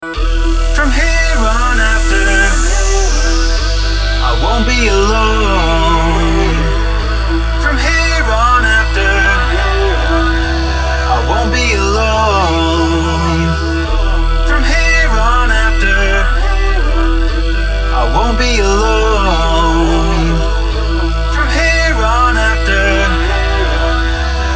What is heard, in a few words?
Laser Noize Outburst